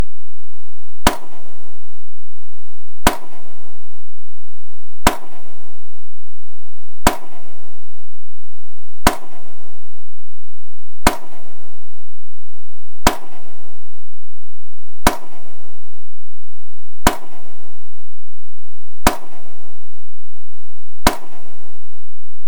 Pile driving high level Ferry Terminal pile driving; Marthas Vineyard; ocean; anthropogenic; environmental